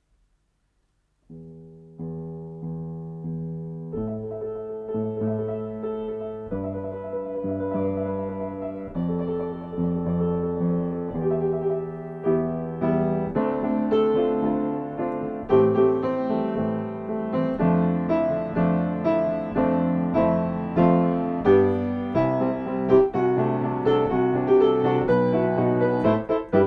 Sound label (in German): theater playback melodie